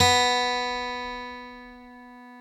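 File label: GTR B4 AC; Synclavier Sampler Library; KEYS AND GUITARS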